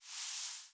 Soft Slide Short

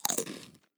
Cracker Bite Crackle

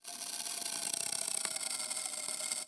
Long Continuous Rustle

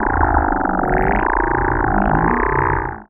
sci-fi buzz

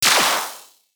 Sci-fi Energy Gun Blast

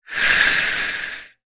Sci-fi Transition

